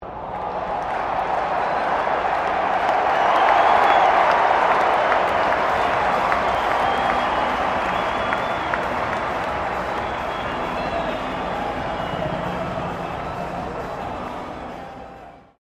Crowd 40K cheer medium, Cricket at Melbourne Cricket Ground; Mcg; Backgrounds; Crowds